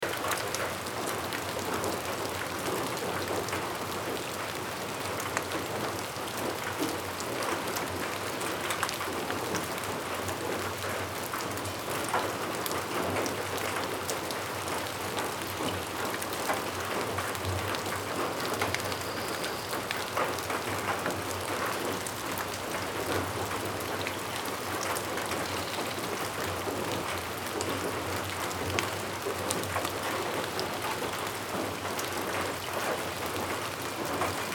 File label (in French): amb pluie